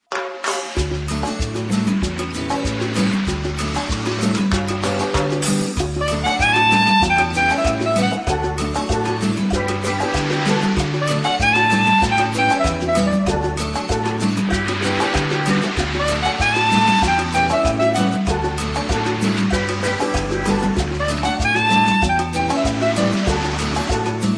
caribbean music tiki bar island luau relaxing virgin island songs instrumental